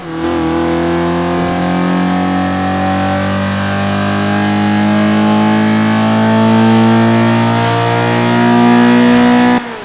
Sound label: third, vehicles; gmod; tdmcars; golf2